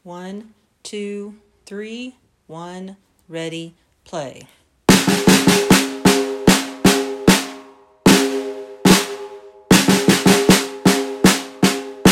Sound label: percussion